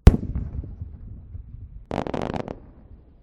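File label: Fireworks Explosion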